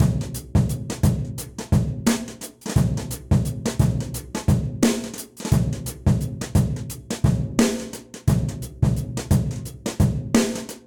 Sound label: Groove Brush Sizzle 87bpm; Session Drums; Session Drums Club; Ableton; Factory Packs